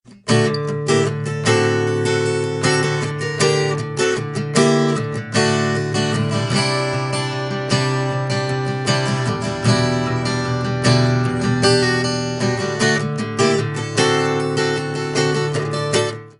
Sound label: Guitar